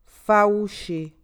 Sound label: taunt